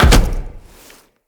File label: Tenno Land Heavy Default, Footsteps; Characters